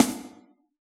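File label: pop kit snare; Giga Samples Collection; Dr 202 Kit 1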